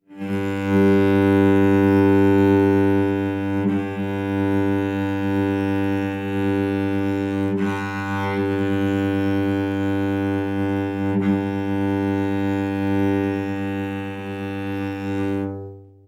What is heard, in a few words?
cello